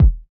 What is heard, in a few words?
fluffy kick single shot e key, steel kick drum sample tuned to the E note; kick drum samples